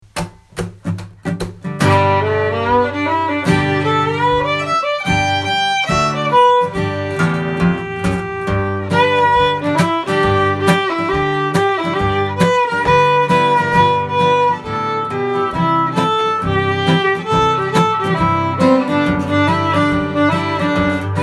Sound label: Blues; SongFarmer